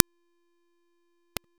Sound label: Thermal Shock Ring; Warframe; Runner